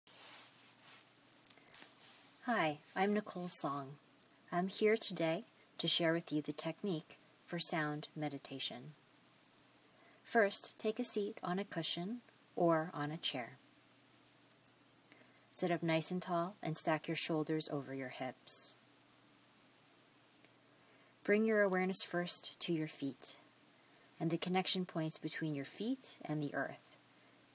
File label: Sound Meditation